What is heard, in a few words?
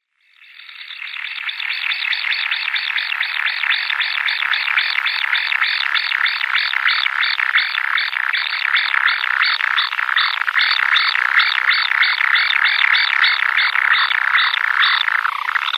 Common Kingfisher nestlings